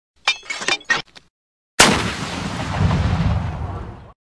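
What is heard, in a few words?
RPG charging and shot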